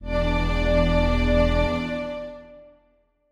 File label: Strings